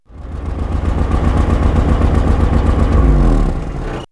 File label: Diesel & Dust; X-Static Goldmine